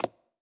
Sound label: Foot Step; blue archive